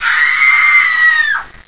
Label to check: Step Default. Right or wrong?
Wrong